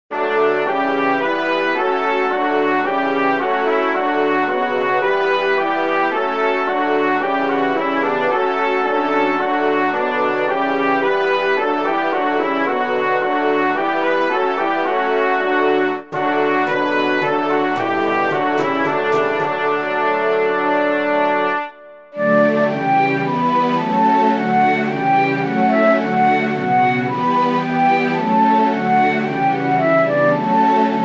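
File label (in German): heut ist geboren keyboard; advent weihnachten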